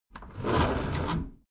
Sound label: window roller shutter close soft single pull